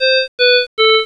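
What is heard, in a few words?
oxygen medium; alarms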